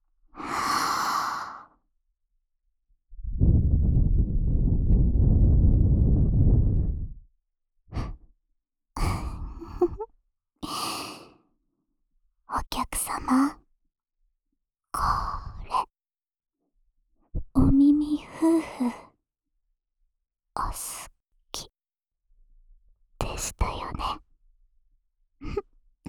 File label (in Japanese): 優しい耳吹き／右, ASMR; ASMR版